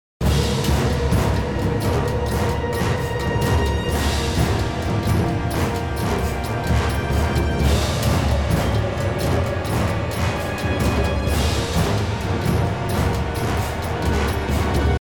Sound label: Kraken Loop